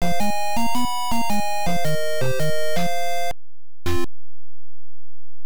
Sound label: Miss; Shinobi; NES